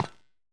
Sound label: Footstep Wires